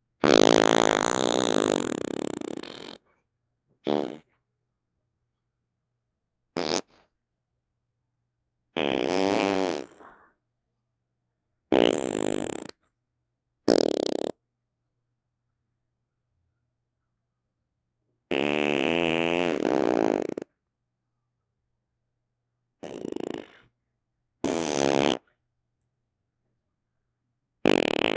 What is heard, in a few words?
fart sounds farting fart; sound effect; body sounds